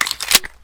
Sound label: mak mag in, weapons